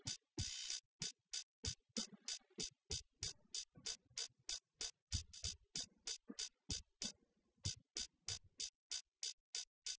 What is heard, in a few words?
wdrums inst sim; SASSEC07